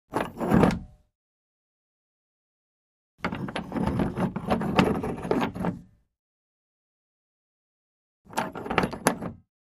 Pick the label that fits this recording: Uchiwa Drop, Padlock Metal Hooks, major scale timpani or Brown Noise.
Padlock Metal Hooks